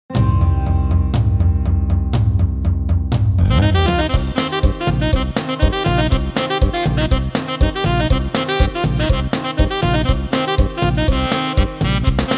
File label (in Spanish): Cumbia